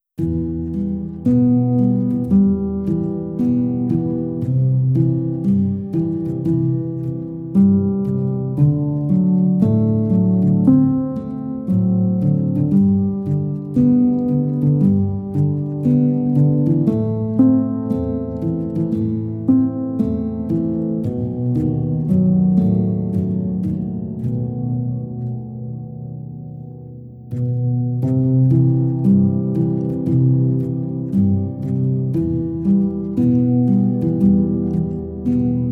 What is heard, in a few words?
Instrumental